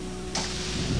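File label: door slide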